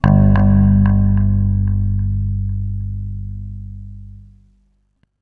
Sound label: Oneshot Bass guitar; zombie army 4 dead war ps4 stadia switch windows xbox one gamerip 2020